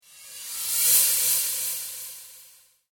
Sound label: Dark Elf Transition, Scary Soundscape